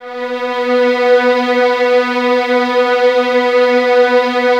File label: Violins B 3, String Ensembles; Light; Miroslav Vitous; 11 Violins